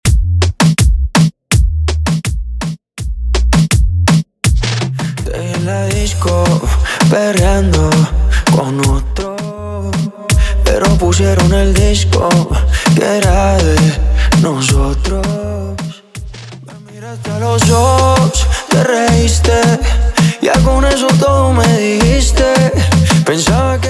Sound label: Intro Outro 82 BPM